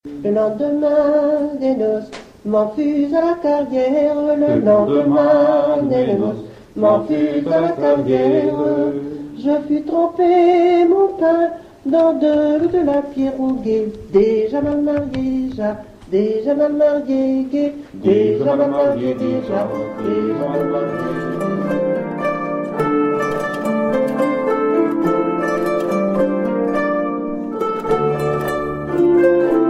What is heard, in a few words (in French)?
Harpe; Déjà mal mariée